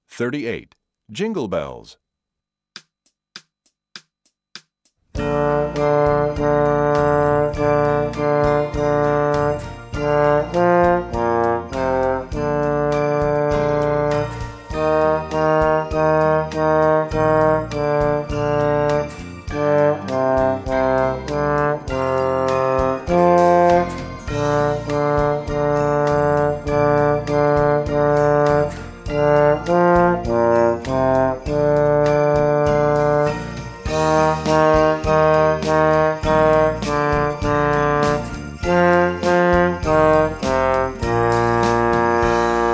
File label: jingle bells